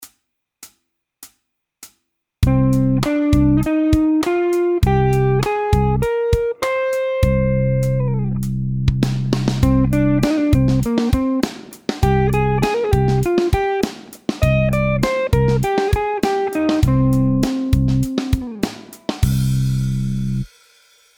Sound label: Scale C Min